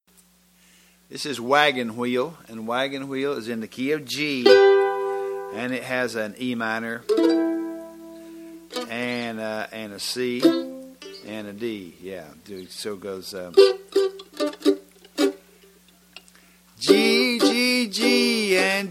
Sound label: (G)